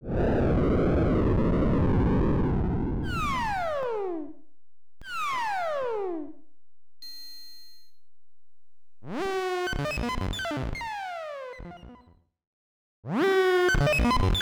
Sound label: room effects